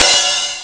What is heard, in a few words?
hh open; Amiga Soundtracker Sample Packs